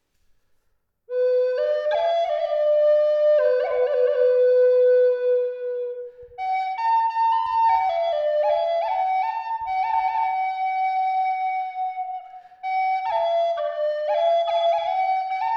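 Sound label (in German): Eastern Red Cedar 6 Loch C hoch 432 Hz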